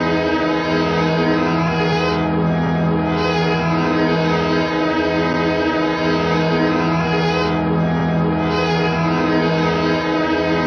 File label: Loop